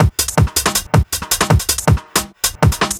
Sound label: Beat, Drum N Bass